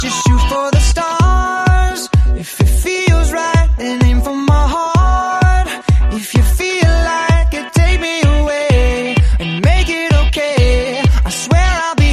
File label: music; cstrike